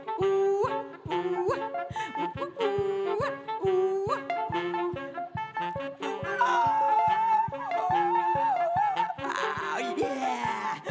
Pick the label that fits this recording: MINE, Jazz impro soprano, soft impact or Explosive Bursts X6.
Jazz impro soprano